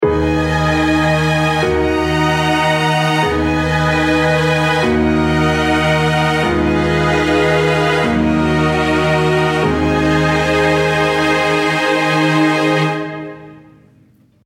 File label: Dark Sad Bounce, piano